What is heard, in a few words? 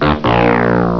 wrong